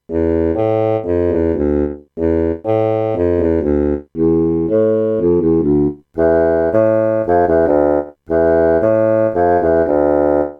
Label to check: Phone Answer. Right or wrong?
Wrong